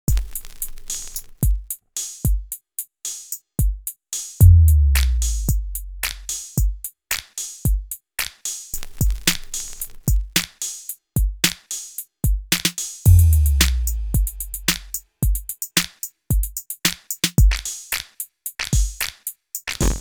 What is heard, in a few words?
Yamaha FGDP-50